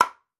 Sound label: toymake